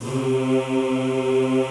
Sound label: C2 R, Classical Choir; Best Service; Extended Classical Choir